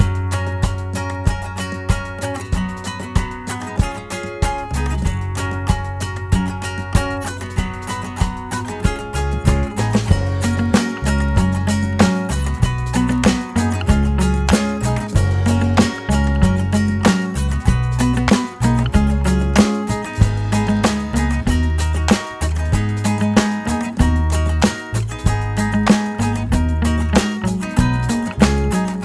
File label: Puzzle Game